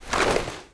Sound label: foley gear rattle